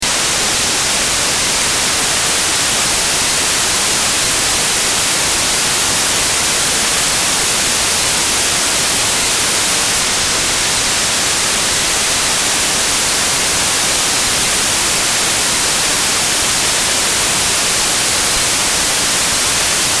hi pass saw

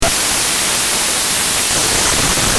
noise

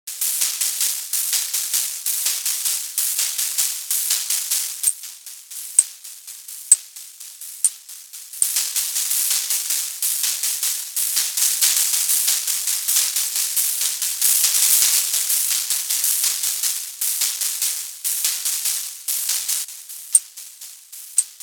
shaker; Shaker-Pulser

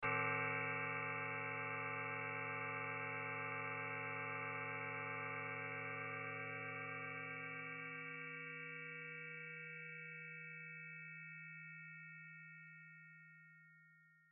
gong

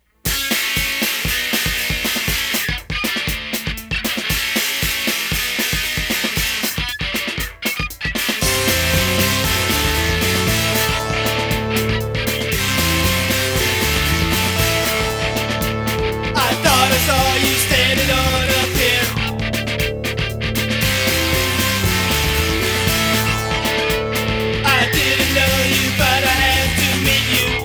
rock n roll

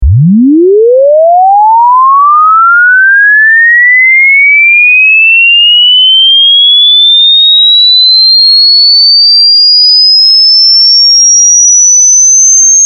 sine sweep

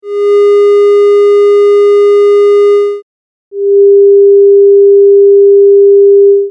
Same F Different Timbre

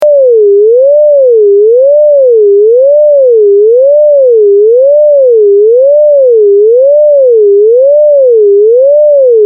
vib

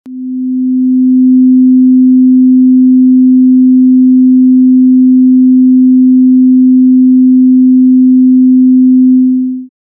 sine 256

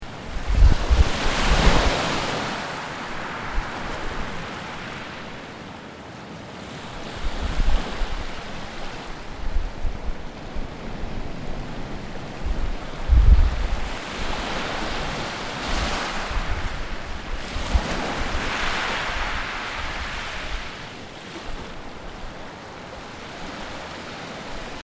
surf

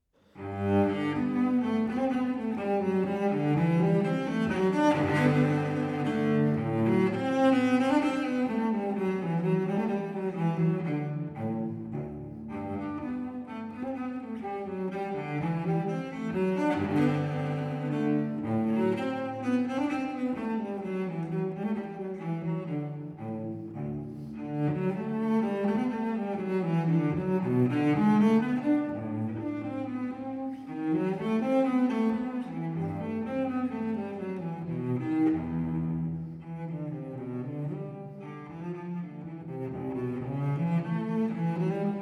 cello suite G minuet, baroque; bach; cellosuite1

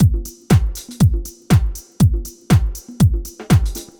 beat

